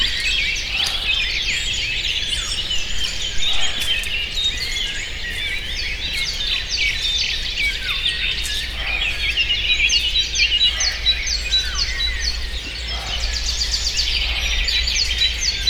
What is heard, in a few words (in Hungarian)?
kora reggel távolban ugató őz vízház; európai őz; cardioid; termeszetben